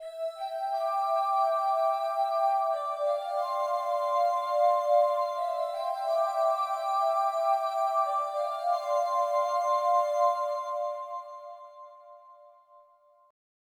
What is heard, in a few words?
SYNTH LEAD; Keyboards; Leads